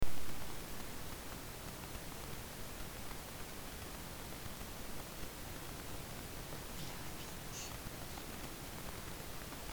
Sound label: ringtone